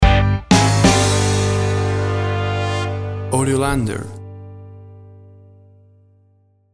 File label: Train Ride into Bluestown Stinger